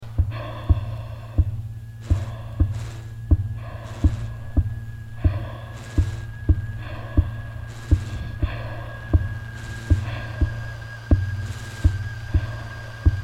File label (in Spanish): arena modulada